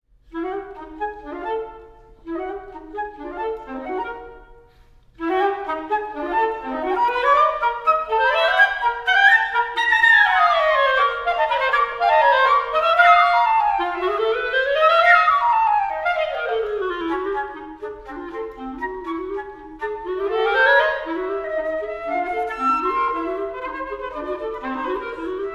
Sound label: duos for flute and clarinet iv allegro ma non troppo